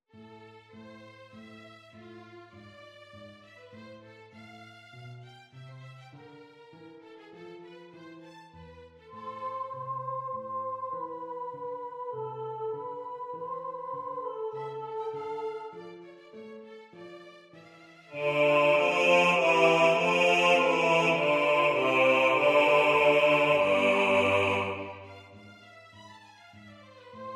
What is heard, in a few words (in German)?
Bass; Stölzel; Te Deum; Dich Vater in Ewigkeit